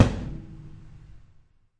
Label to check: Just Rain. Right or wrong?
Wrong